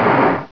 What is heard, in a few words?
Ship Stoping; Bedlam 2 1.1.1; Bedlam Sounds